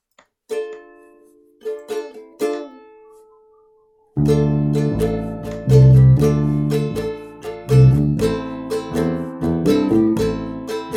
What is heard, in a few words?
Bass Practice File